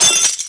crash

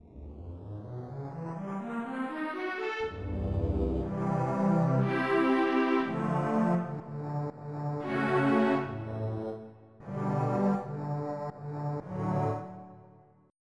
String Ensemble; FF7

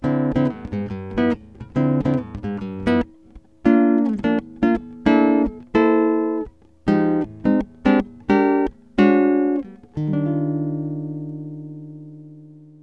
splash

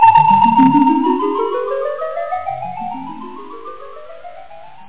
bell bubble

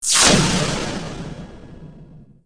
laser; ImpGal2; spacewar weapons

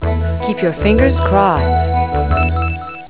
crossed

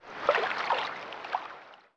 FT SWIMA

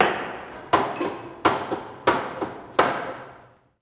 HAMMER; zones; construction

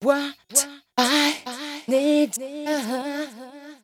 VOICE GRL HOUSE 125, House Voice; eJay

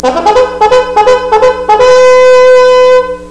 bugle charge

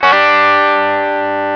Guitar; Sound Library 2000; Wayzata Technology

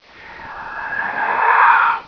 idle, wizard; Quake; PC Gamer 51

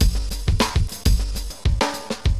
DM LOOP, HipHop, Drums

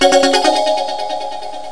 emergen

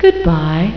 Log Off; FirstClass; VillageSettings 3.80

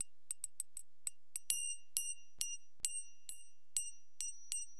FBELL, Perc, Acid Jazz Funk; LiveAct Pool 1

